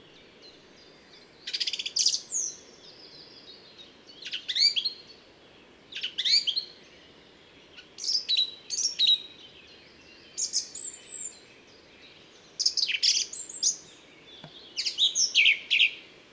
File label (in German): Singdrossel; Moos DEG; Michle Peron